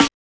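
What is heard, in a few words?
snare drum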